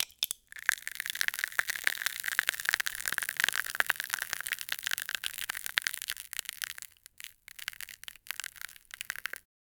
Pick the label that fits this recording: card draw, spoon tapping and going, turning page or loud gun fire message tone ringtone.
spoon tapping and going